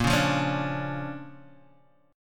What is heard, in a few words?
D Minor Major 7th Sharp 5th Bb, guitar